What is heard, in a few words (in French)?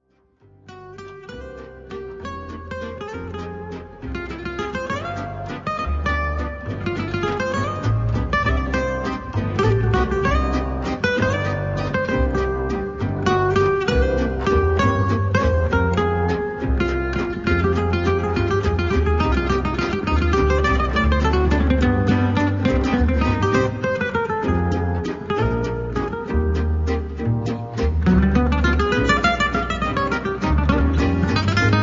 valse